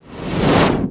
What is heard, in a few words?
speed End; Feeding Frenzy